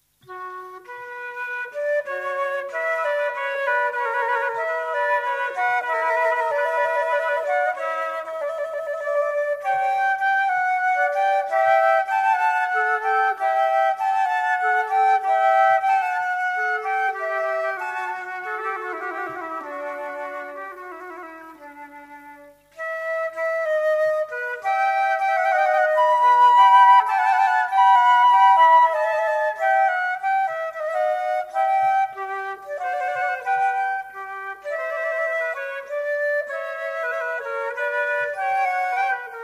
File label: Sonata for two flutes; Telemann; Six sonatas in Canon for two flutes